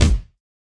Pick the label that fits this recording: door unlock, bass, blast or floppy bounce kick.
floppy bounce kick